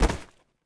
bodyfall, foley

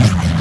rev low, ships engine; Quantum Redshift; XBOX; leah

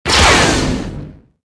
bowcaster

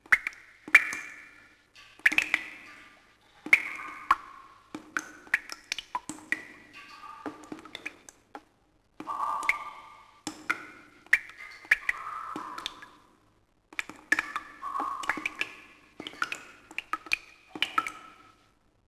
water dripping cave; ambient

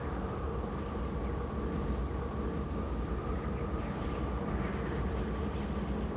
wind, ambience; Star Wars Obi Wan; XBOX; coruscant

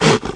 Large Spear Bark

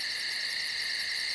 night; Ambient; MX vs ATV Unleashed

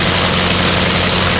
microlight loop; Chase Hollywood Stunt Driver; XBOX

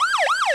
Alarm; Project Gotham Racing

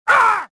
pain; rebel

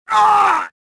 choke; Star Wars Jedi Knight II Jedi Outcast; XBOX; bespincop

